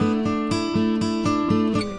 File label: acoustic guitar picking